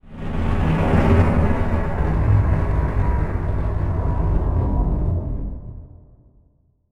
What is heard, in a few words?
Transition Stab Dark Medium